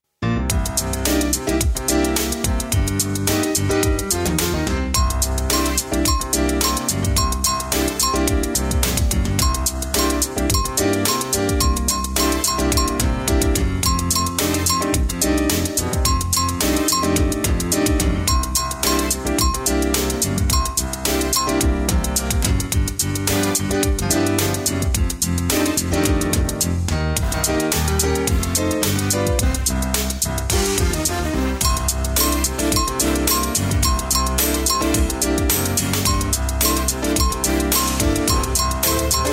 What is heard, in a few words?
ABC song Playback